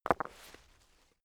stone midsize drop leaves

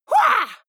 goblin fight shout attack; soundpool

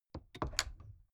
mechanics bits; industrie-wirtschaft; mechanik

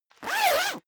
zipper down jacket open fast; objekte; reissverschluss

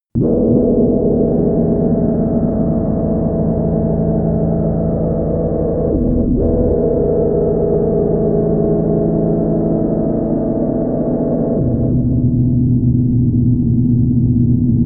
music elements; pressure drop; flaechen

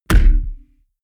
heavy bass saturated damped, hits impacts; whooshes rises

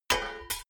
metal clad plate hit; industrie-wirtschaft

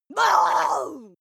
witch groan gurgle; kreaturen

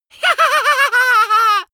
goblin laughing, comedy cartoon vocals; soundpool

